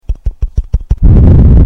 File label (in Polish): trzaski